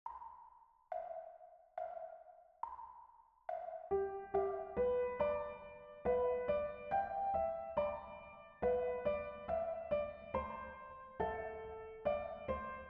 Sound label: melody, gitarre guitar; Am Weihnachtsbaum die Lichter brennen; Weihnachtslieder